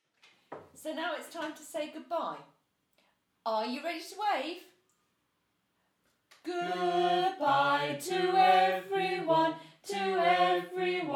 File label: Goodbye song